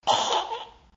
Choke; Lewd SFX

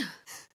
Breath; Lewd SFX

